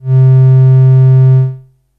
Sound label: Tristar, synth lead